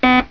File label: alarm; Foscam MJPEG Windows HTA